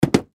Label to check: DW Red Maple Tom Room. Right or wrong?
Wrong